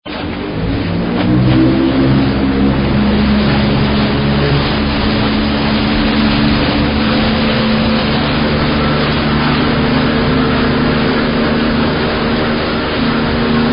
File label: Motor Boat (With Wavs Crashing); trasporti; navi